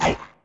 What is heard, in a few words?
attack; Fly For Insanity